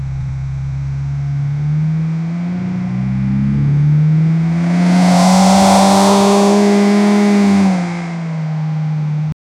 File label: aggressive sports car en, redline